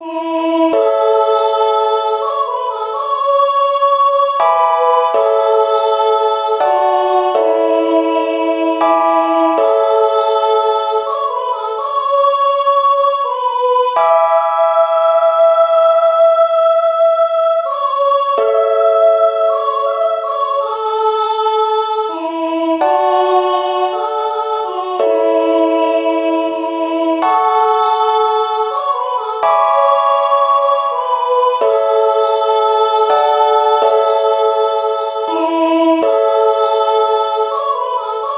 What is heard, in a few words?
Amazing Grace Uke